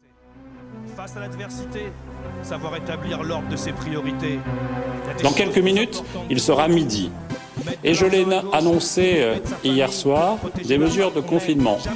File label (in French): jingle; acentrale; c est con les canards